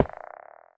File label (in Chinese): 磁石放置声